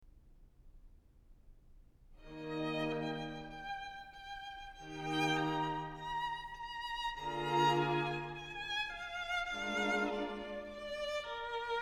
String Quartet No. 6 in B-Flat Major, Adagio ma non troppo; Ludwig van Beethoven; Beethoven - String Quartets No. 1-6, Op. 18